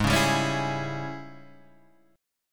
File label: G sharp Major 7th Suspended 4th Sharp 5th, Guitar, Half Step; 5,5,6,7,6,5